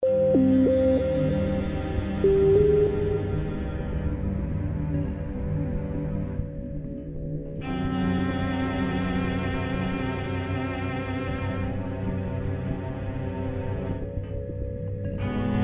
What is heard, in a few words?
ambience